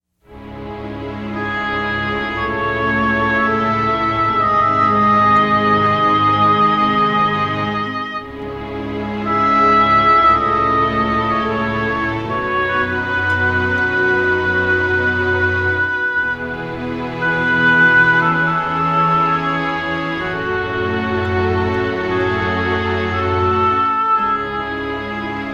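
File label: Campaign Music; heroes of might magic iii hd 2015 ios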